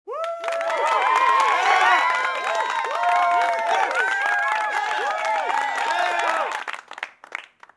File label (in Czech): aplaus; Mafie 1